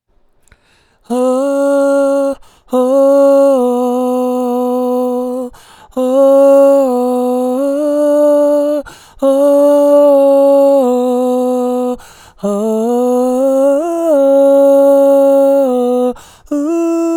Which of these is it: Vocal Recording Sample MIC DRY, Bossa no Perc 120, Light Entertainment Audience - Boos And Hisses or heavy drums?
Vocal Recording Sample MIC DRY